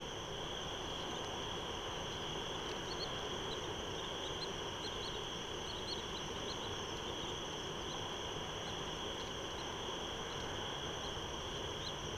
chatter

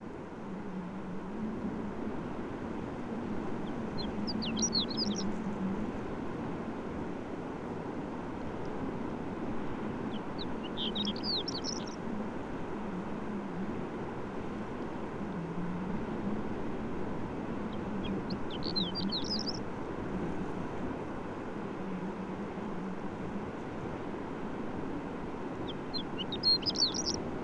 Song In Strong Wind Over The Arctic Tundra

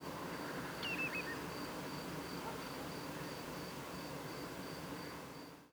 low last note

